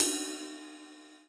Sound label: Korg N1R Ride Cymbal